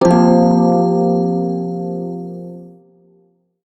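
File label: pentatonic minor chord, shawzin; Warframe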